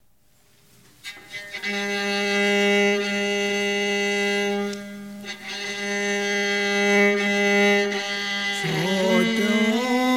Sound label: Singing